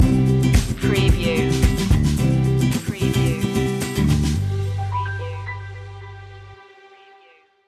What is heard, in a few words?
easy travel stinger